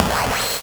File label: Glitches; Antidote Advent